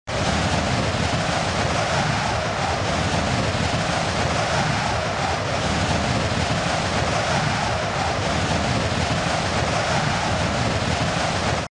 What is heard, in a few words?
ambient; National Geographic